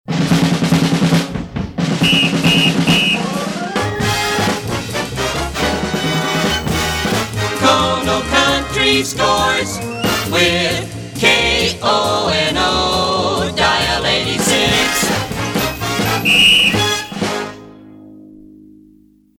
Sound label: All American Sports; PAMS; KONO